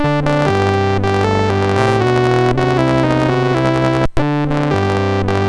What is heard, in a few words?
BASIC sid MOS6581R4